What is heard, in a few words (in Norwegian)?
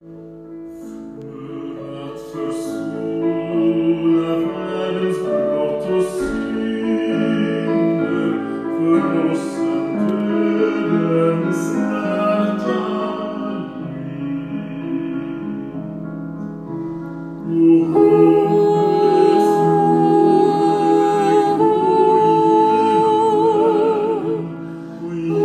o helga natt sopran; åfjord; 52 o hellga natt